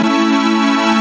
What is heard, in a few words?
f major left